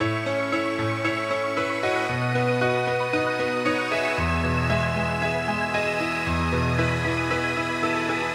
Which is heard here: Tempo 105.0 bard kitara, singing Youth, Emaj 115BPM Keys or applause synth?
Emaj 115BPM Keys